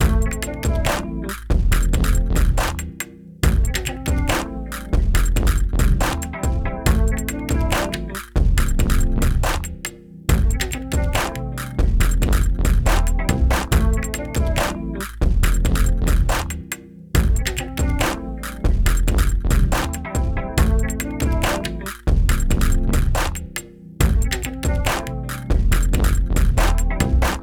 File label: Rap Loop; The Jackbox Party Pack 5; Jackbox Games